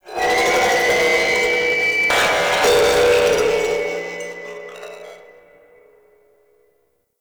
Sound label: Speaker Stack Screech; Ambience; Props; Infested; Warframe; NineteenNinetyNine